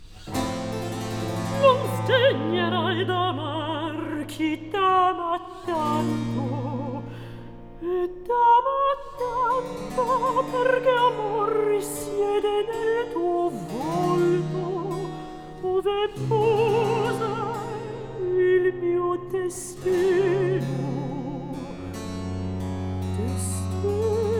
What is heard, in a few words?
Recitativo; Arianna; Ah! Crudel, nel pianto mio, HWV 78; III. Recitativo Non sdegnerai d'amar